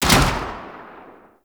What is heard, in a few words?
Rifle Shot Alt; Warframe; Tenno; Sundial Rifle